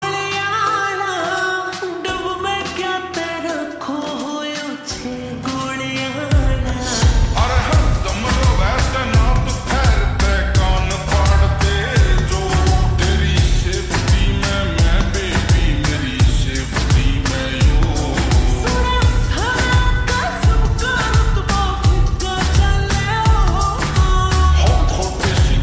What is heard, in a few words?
Slowed Reverb, haryanvi